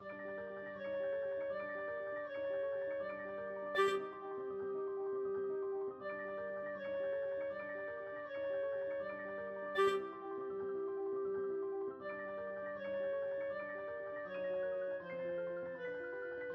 carnival aquarium, clarinet

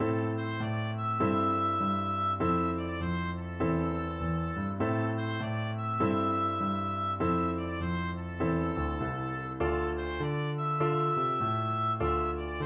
oboe

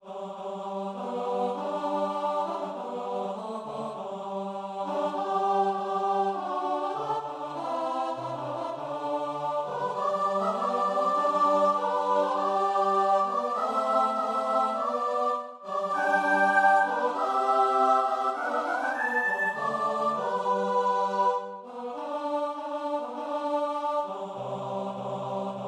hark hark glad, choir

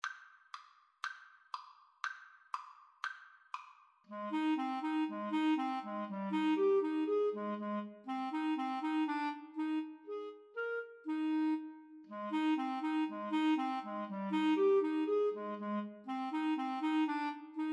Clarinet Duet

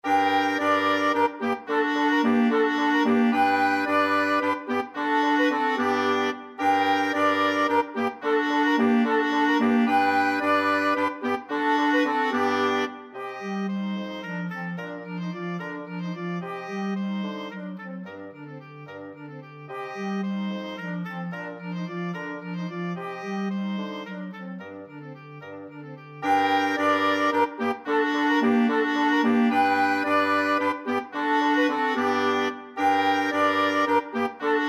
flexible mixed ensemble, 4 players

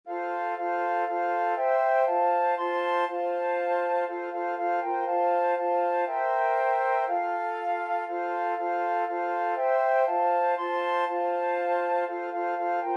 flute quartet

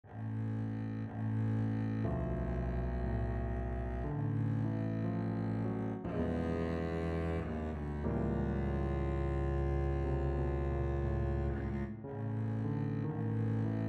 happy jazzy birthday, double bass

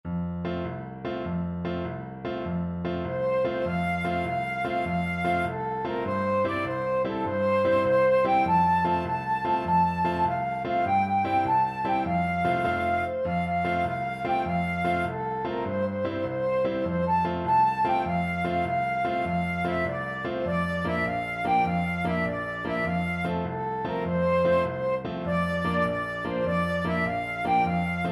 flute

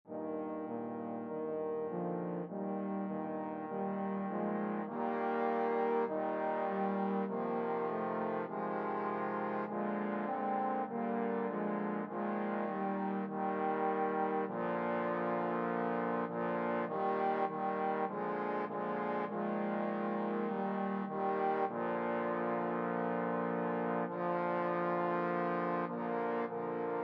trombone quartet

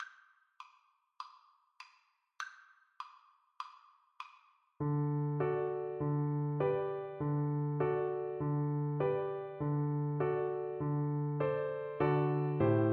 double bass

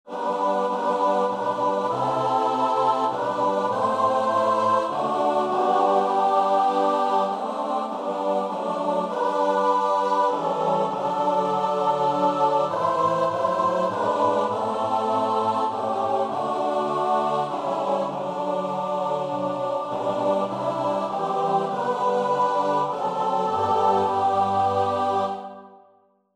choir; jesus very thought

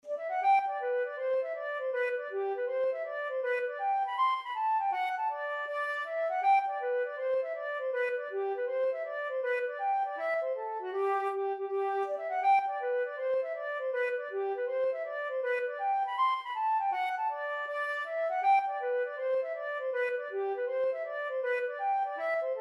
hornpipe flute

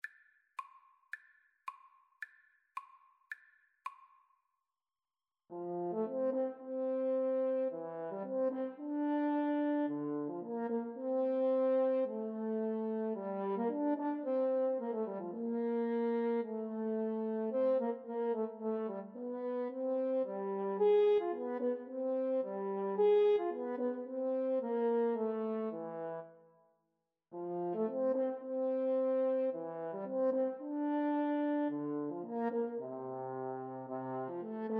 French Horn duet; schubert sym 5

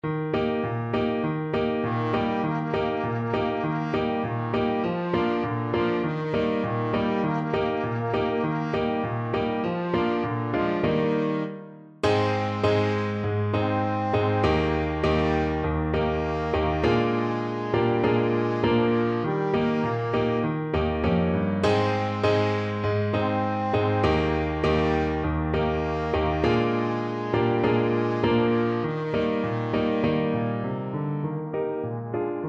trombone

